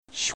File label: zip